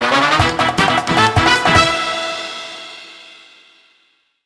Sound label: Game End Of Room; penguins of madagascar the 2010 nds